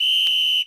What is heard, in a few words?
One Blast Whistle Short